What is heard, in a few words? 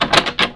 Locked Door